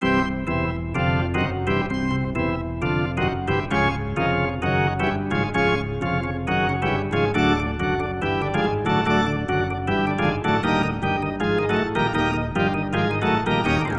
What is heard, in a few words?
Funky Organ; Backyard Hockey